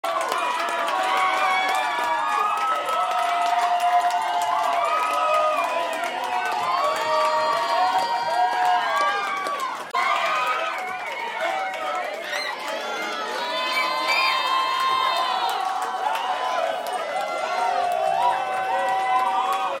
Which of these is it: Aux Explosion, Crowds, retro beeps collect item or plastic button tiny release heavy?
Crowds